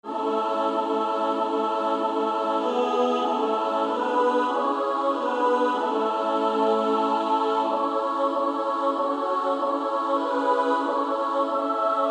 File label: Female Choir, SSAA